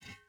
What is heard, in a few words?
ui pickup metal hollow large; Rust